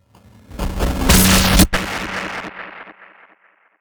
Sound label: Electric Energy Bit Erosion Blast Powerup; Electric Arcs and Energy; Sound Spark LLC